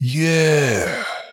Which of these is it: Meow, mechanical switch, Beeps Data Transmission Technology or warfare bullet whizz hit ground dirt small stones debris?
Meow